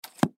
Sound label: Slingshot Shoot, Slingshot Shoot Object Impact Thud; Foley; Combat; Blastwave